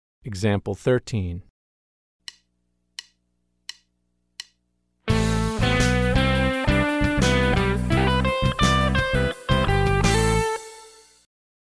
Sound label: Country Rock